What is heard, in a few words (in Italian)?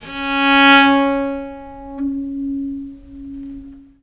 C#3, Historical Instruments, VIOLE MUT; USB Soundscan vol.23; AKAI